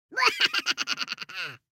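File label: small purple laugh; Various Evil Sounds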